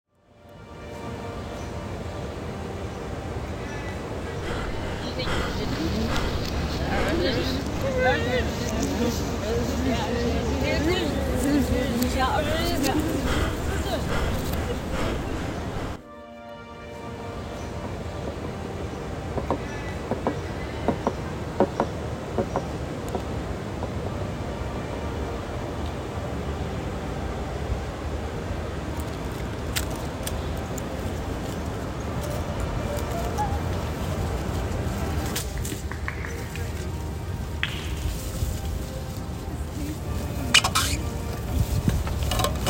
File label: Neighborhood Soundscape; summer institute 2026